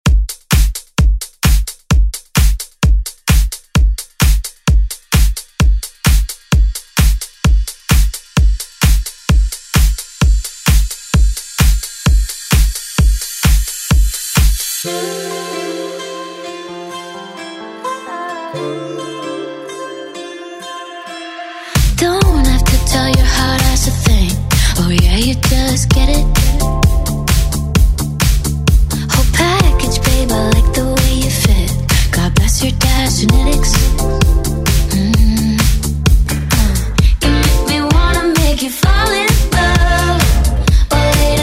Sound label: Pop Redrum snippets; Deejay Hit